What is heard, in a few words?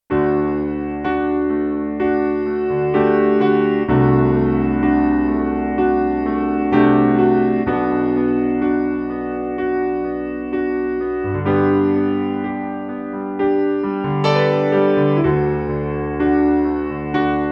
Grandioso, Roland D-70; Factory Performances